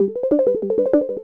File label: Jingle Time Bonus Loop; Super Rub 'a' Dub; PS3